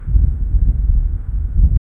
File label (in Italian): trovo distante, weather